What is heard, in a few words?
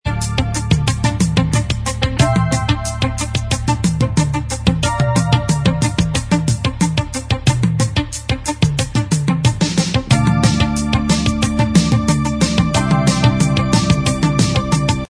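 instrumental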